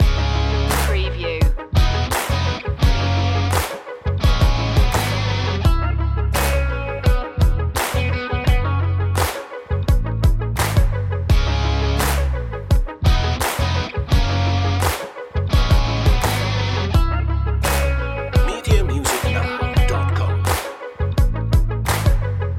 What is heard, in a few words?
groove loop